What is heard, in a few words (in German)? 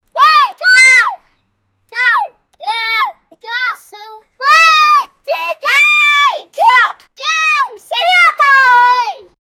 alter mann schreit ein ki, yelling